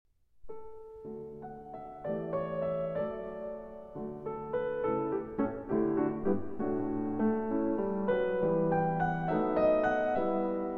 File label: Piano Sonata No. 7 in D Major, Op. 10 No. 3 III. Menuetto. Allegro